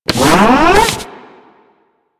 Elevator Door Open; Elevators; Clan Dojo

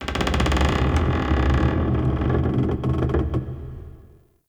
Cave Mine Wood Beam Creak; Ambience; Midrath Island

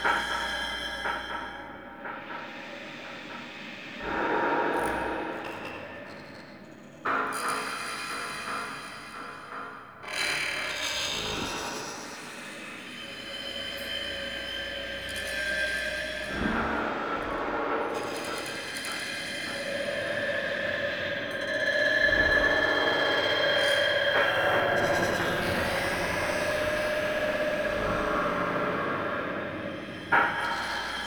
Interior Bed Loop Small; Warframe; Ambience; Sentient Devourer

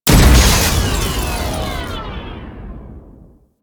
Vent Explosion C; Warframe; Corpus; Arachnoid Camper; Death

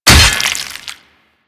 Axe Impact Flesh; Warframe; Weapons

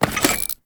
Sticky bomb Reload; Grineer; Veilbreaker

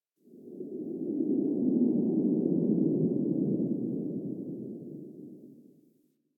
Forest Cave Howl; Ambience; Warframe; Grineer Forest

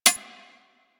Quill Dart Gun Low Clip Mech; Warframe

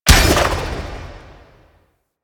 Fist Shot; Enemies; DE; Warframe; Tyl Regor

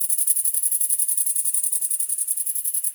Meadow Close Grasshopper; Ambience; Day Animals

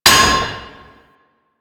Worker Hammer Impact Metal, weapons

